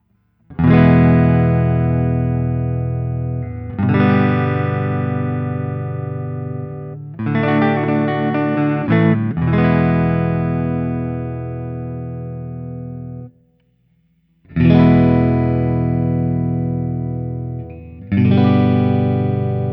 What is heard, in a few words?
Guild X350 Stratford ODS100; Guild NS X350 Stratford